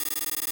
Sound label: typing